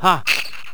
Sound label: horseman attack; megaglest